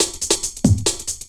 LOOP SD, Drum & Bass, 140 BPM; Ueberschall; Drum & Bass Resonance

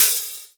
Open HH, Cymbals

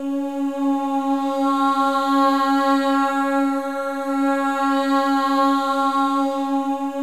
MOVING V4, Symphony of Voices; Spectrasonics

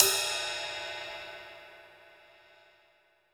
Rock Ride, cymbals; Roland BK-5

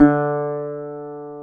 GTR, Dobros, National

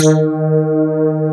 wet bass

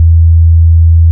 BASS, Tekno Industrial; East West

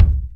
HOLO, KICKS

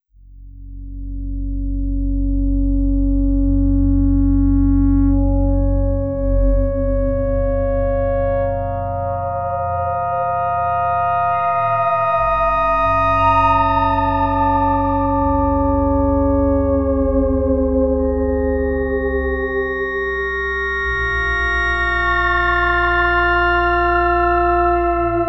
FM Bender, Melodic; Sonic Foundry (Sony Creative Software); Slow Silhouette

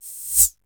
hat

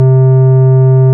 ORG Farfisa Flts; Flt 8'; C 2; Keyboards of The 60's and 70's

